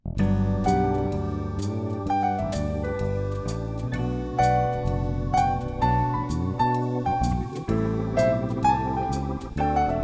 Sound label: jazz music bed; ADOBE AUDITION (Cool Edit Pro2); MusicBeds